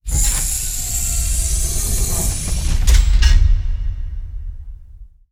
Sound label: Door Air Lock Closing, foley